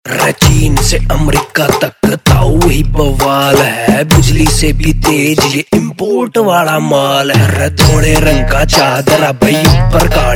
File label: Ringtone, Bollywood; Kung Fu Yoga